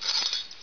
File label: key; wartso